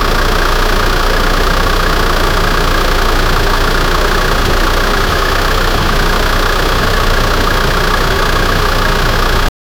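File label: diesel engine biturbo; 2000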